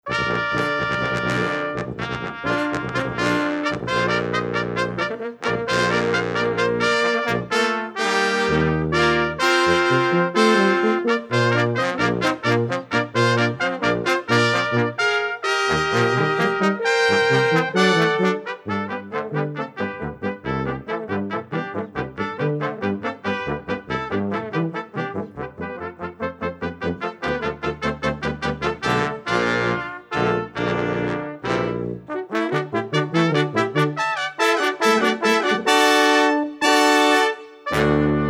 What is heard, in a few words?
Western Fanfare